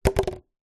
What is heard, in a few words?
drink empty take away coffee cup fall over; food